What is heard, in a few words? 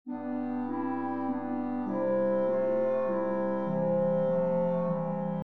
chord progession, music